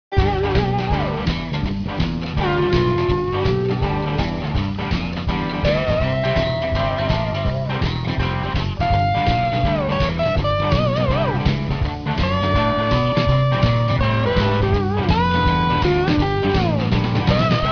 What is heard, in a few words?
On Hold Jazz